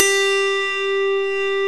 AUTOHARP; OMI - Universe of Sounds

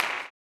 CLAP, blues & soul; ILIO; Vocal Planet

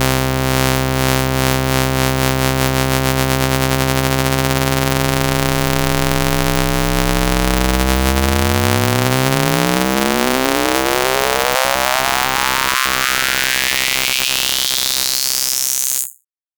Sweeps Up, Effects

